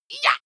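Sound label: female combat attack short, screams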